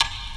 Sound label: perc, lofi kit, percs; x.shulz lofi kit